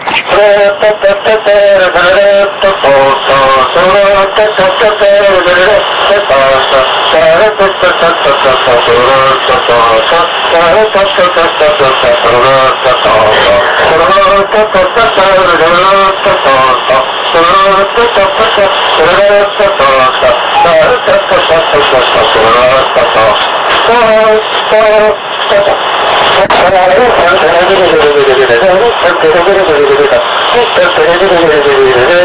joc si cantec popular vasluian; Chiriac fara izmene; Muzică românească; Centrul Judetean pentru Conservarea si Promovarea Culturii Traditionale Vaslui

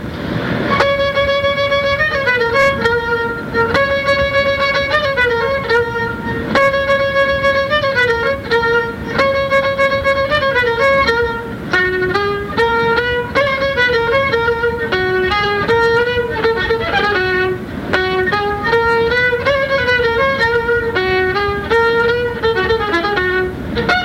Chindia, joc popular vasluian; Centrul Judetean pentru Conservarea si Promovarea Culturii Traditionale Vaslui

